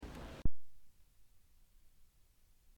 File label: instrumental